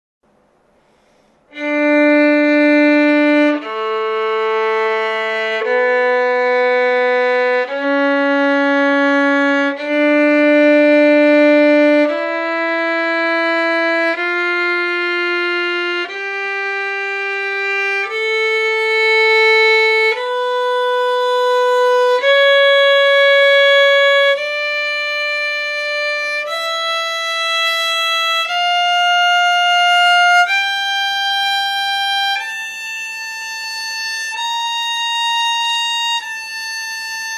scale arpegios d moll

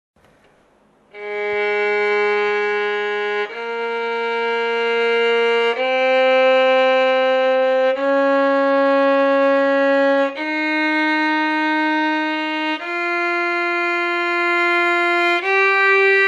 scale arpegios As dur

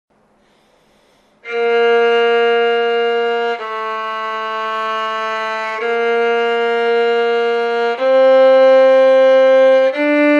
scale arpegios B dur

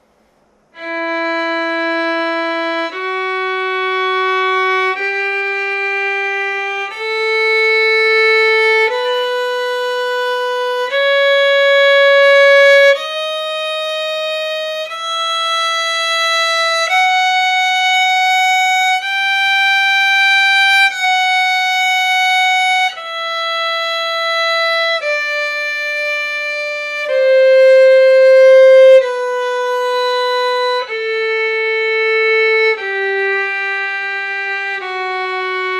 scale arpegios e moll

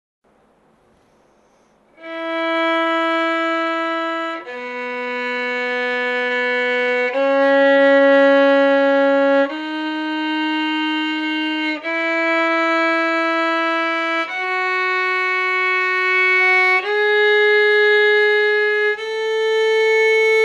scale arpegios E dur

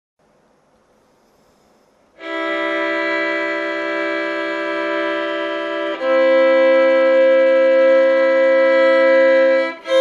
doublestop C dur

